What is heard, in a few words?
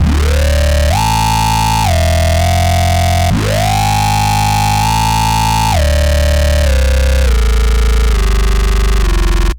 Bass Layer Bass